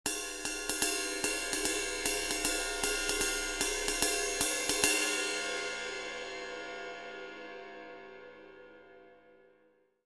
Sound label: 21in Sweet Ride Brilliant, cymbal